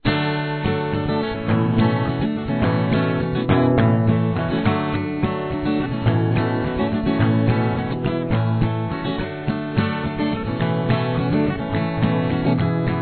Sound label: solo